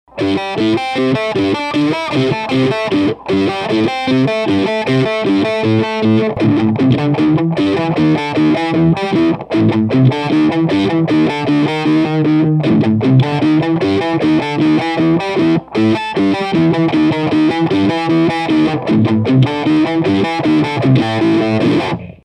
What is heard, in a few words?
Tap Two